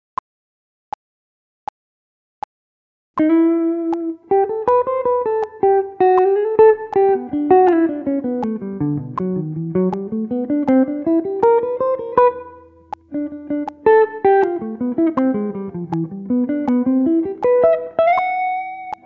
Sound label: guitar solo 80 bpm